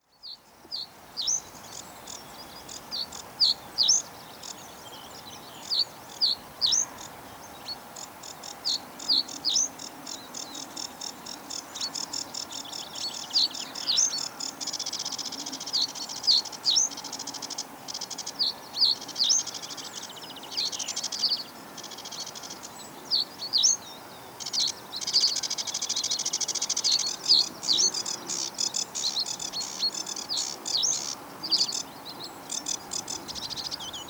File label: Reed Bunting, birdsong